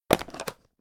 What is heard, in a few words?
Open A Tupperware; LOOPERS